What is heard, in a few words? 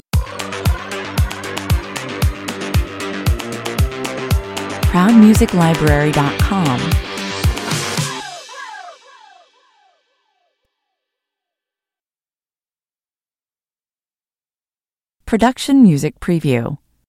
stinger